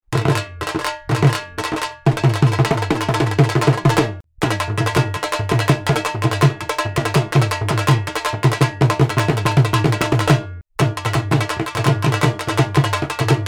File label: dhol pickups